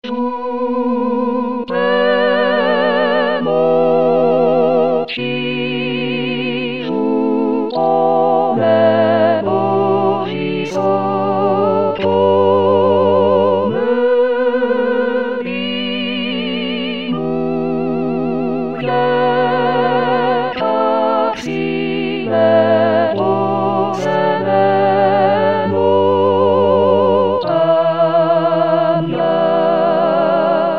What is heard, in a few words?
Tutti